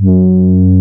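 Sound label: horn G2, synthesizer analog horn; AKAI S6000 CD-ROM - Volume 1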